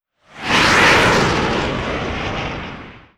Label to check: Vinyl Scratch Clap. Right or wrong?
Wrong